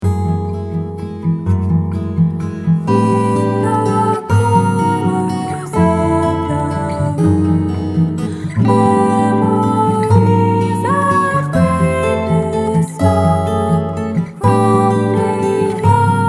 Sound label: ALT FOLK